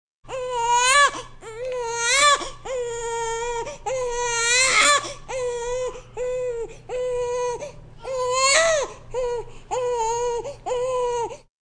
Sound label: Crowds; 헐리웃특수효과음; 군중-혼잡